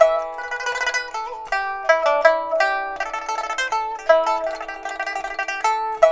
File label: PIPA, 90; INIS - Opium